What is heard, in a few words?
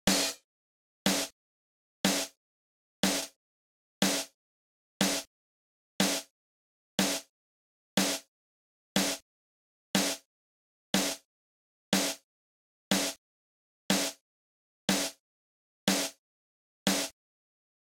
drums